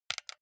console controller xbox rb creaky press

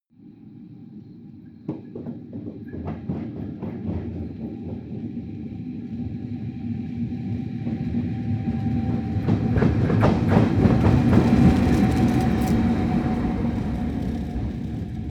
train locomotive drive by bump close

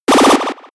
80s laser gun

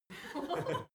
laugh short

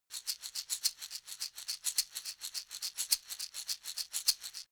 shaker wooden slow short

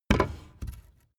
wood impact soft

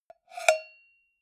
cowbell scratch hit muted

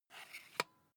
air pump small in

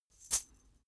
chain mail march single left

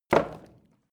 wood drop indoors

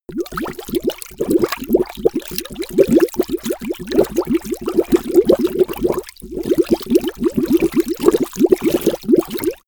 bubble

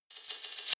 reverse hit wind slice

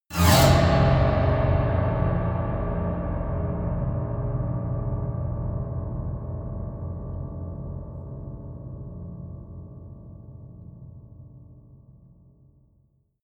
piano twang fast squeal long

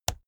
keyboard laptop key push hard

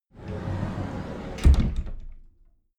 cupboard slide door open regular

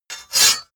sword cut hard scrape short

